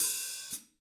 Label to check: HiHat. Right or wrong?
Right